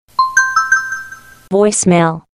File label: voicemail alert